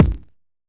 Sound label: 90s hiphop kick; Reference beat bpm90-A